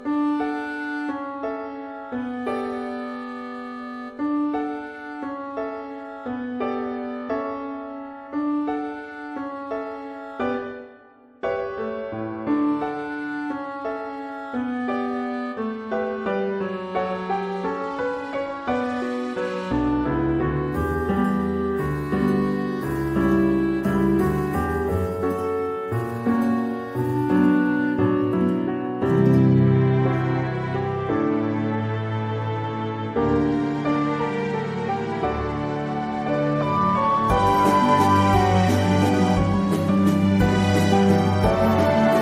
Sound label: Instrumental